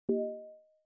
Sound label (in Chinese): 共鸣; Teams; Classworks